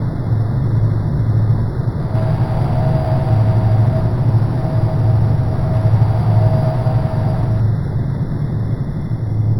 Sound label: loop, techno atmos